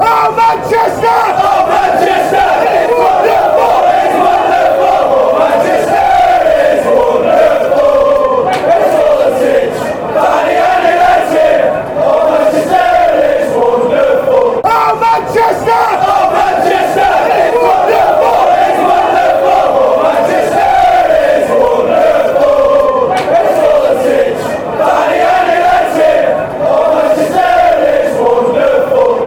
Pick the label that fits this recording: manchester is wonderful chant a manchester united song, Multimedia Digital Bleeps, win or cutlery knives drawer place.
manchester is wonderful chant a manchester united song